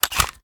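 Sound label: pistol reload empty extended mag mag out; gmod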